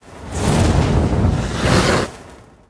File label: Flamecaller